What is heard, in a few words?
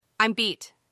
beat